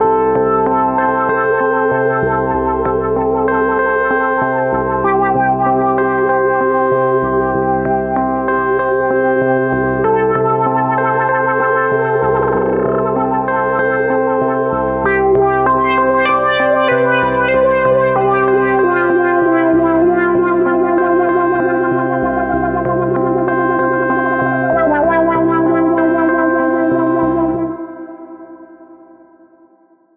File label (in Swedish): ring, ringtones; sound of oo website ringtones